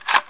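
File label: crossbow start pull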